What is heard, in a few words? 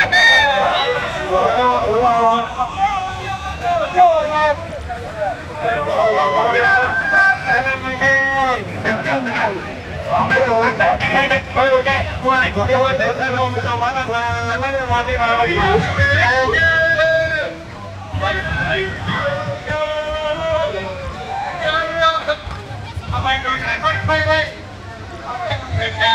a lively indonesian night